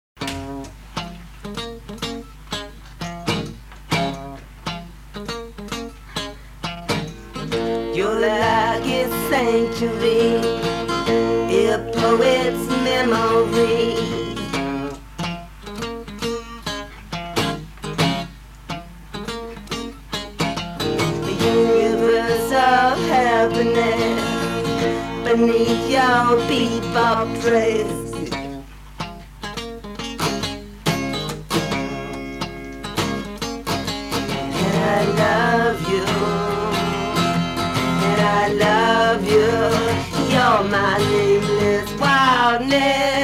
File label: acoustic